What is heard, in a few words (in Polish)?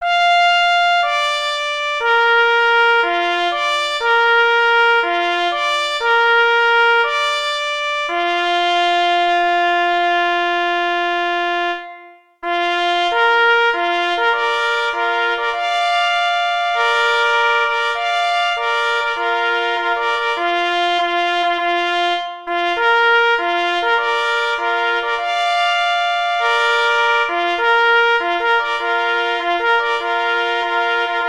Hejnal Marsz; R. Idzikowski; KL nr 20 Lis w Liszkowie